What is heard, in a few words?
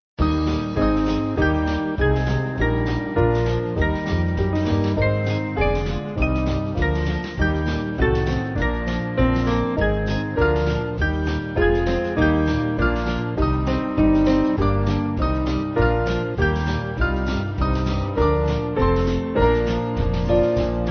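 Band, Music Backing Files for Churches; Gottes Sohn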